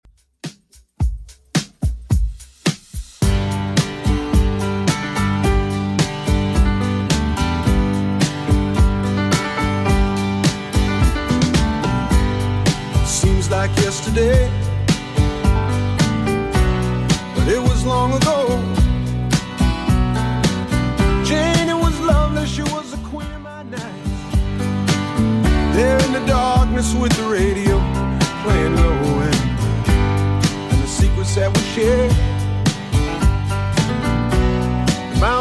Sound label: 70s Redrum 108bpm